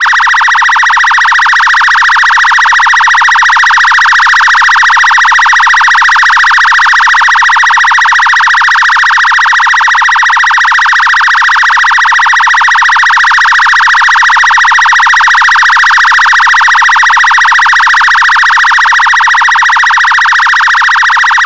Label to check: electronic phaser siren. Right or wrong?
Right